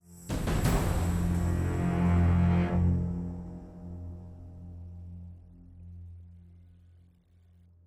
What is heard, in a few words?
Action End Sting; Dead to Rights: Retribution; Mu Shadow Action 04 End Sting 02